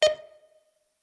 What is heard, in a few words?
beep; cstrike source; nippersounds